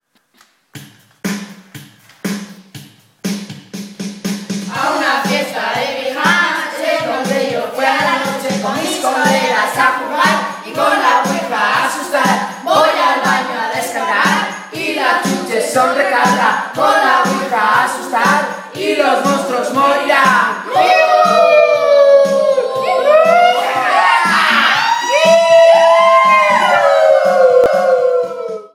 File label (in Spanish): Marcha militar pijamada